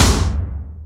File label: FX snare; E-MU Formula 4000 Series Vol. 2; OrbitPercMaster